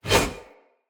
Whoosh, Swords; Weapons; Mockery; Mockery Brazard

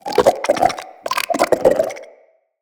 Shrimp Lantern Vocal Idle, Creatures; Baliq

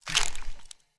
Foley Jump; Enemy; Characters; Corrupted Sproutfolk

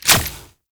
Sniper Charge Up End; Weapons; Warframe; Sentient; Caliban Prime Sniper

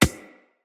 Beach Ball Bounce Dirt; Water Fight; Beach Ball Floof

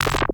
Corrupted Notes; Warframe; Bard Quest; Bard Boss Fight Notes

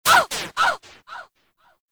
Player Hit Death; Warframe; Caliber Chicks Minigame

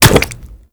Goo Gun Sack Land Large; Warframe; Grineer

